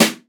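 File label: brush hard; Northstar - New Gold VOL-2; 50S BRUSH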